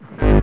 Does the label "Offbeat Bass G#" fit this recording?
Yes